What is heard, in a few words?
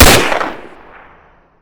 Inf Weapons; Red Orchestra 41-45